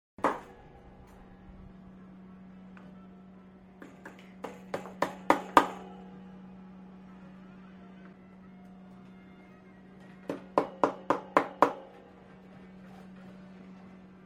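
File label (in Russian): молоток на фоне столярной мастерской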